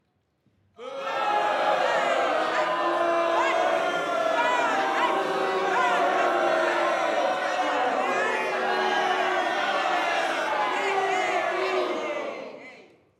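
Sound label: Crowd angry Booing Angry; Shanevincent; Spacedomni; Mk012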